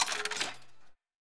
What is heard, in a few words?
scatter Counter Up; netent